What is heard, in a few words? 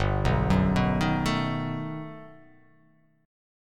G Augmented Major 9th